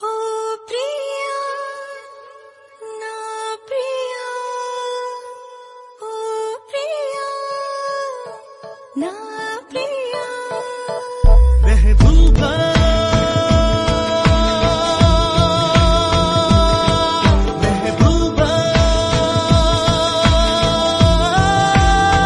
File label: Telugu Love Ringtone